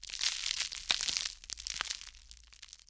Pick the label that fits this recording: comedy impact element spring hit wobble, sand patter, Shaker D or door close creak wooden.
sand patter